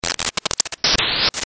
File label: Chattery Snap Break; Best Of N2O Library; Breakers & Transitioners